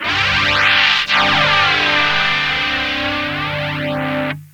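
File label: guitar hoax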